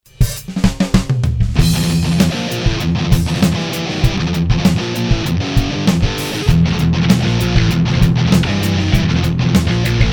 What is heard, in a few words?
MIC & DI; M5